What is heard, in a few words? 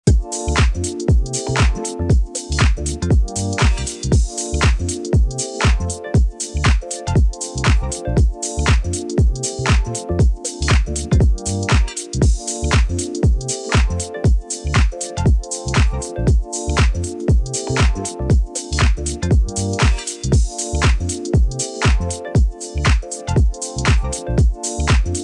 a house music song with funky beats; music fx